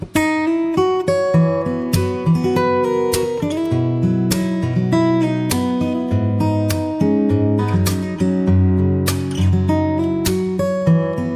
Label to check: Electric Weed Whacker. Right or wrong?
Wrong